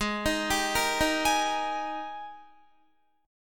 Ab Major 7th Suspended 2nd Ab down Guitar

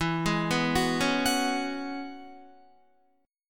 E 6th Add 9th E down Guitar; E6add9 arpeggiated; JGuitar

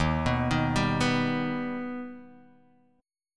D sharp Augmented D sharp Guitar DADGAD

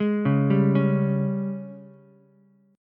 Ab Major 7th Suspended 4th Ab down Ukulele; AbM7sus4; arpeggiated

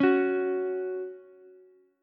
F sharp 5th, Ukulele; strummed; JGuitar

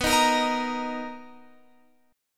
B Major 7th B down Guitar Open F; strummed

